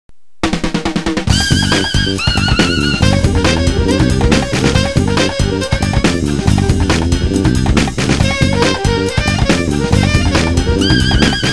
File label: jazz jam